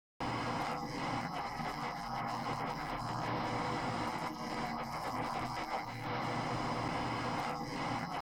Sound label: noise